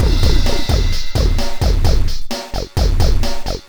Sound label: funk, bpm130